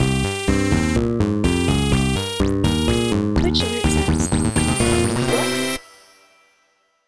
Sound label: 8 bit host